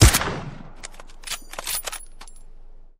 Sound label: Gun Sound; Gangstar; Gully Loops